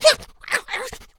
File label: weird effort; Nut Boarding